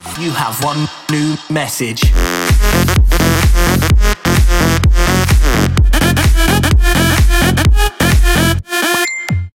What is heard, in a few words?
New Message Trap